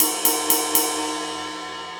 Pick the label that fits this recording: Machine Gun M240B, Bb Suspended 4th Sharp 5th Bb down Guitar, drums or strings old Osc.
drums